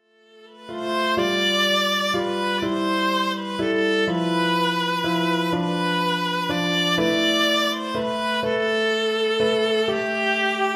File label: larghetto vla kl; i strawinsky